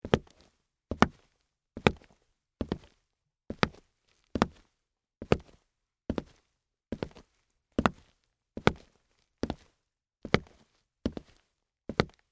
Combat Boots Walk, Footsteps, Combat, Boots, Walk, Wood, Surface, Slow; Blast Wave